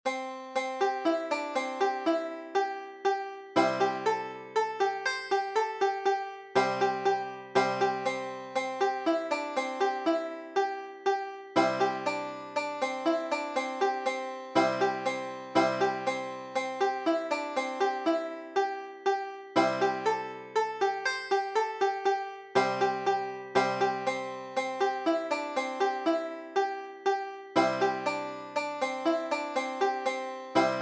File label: Banjo